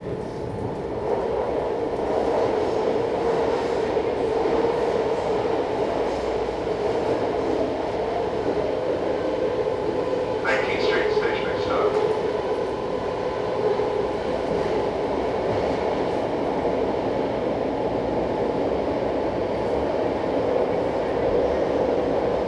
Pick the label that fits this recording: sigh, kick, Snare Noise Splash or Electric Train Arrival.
Electric Train Arrival